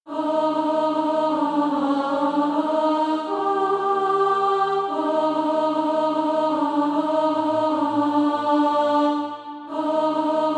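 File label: alto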